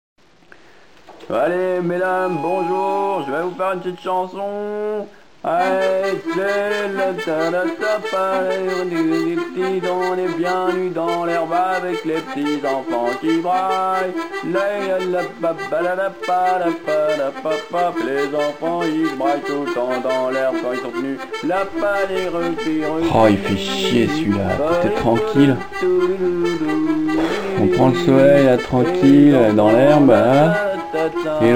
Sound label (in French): accordeon du dimanche